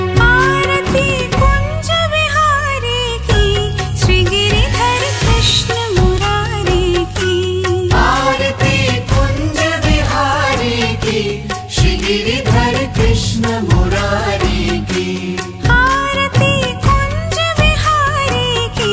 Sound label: Aarti; Jai Shri Krishna; Devotional Ringtones